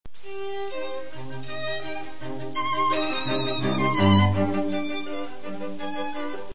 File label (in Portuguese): trio menor; musica classica